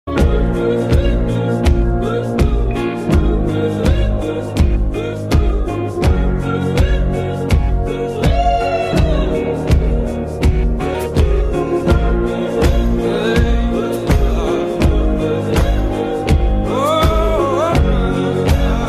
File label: ringtone villain beats